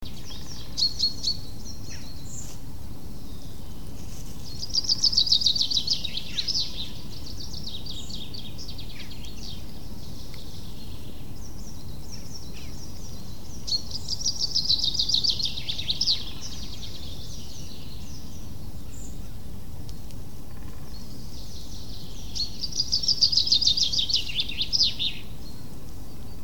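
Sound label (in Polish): zieba, finch; eetu paljakka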